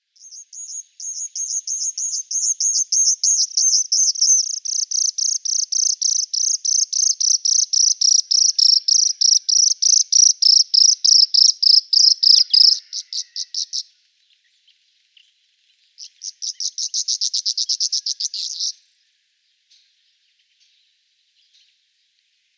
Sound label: Cyprus Wheatear song